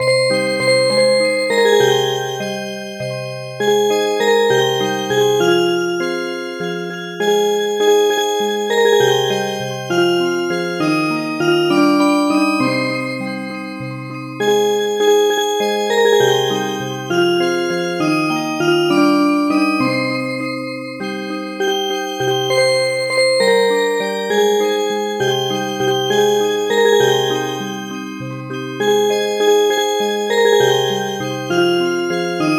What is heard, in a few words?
Music Box, midi